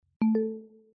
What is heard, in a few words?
Notification